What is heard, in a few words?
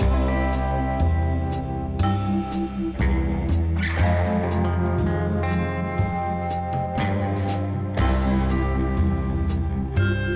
Instrumental Battery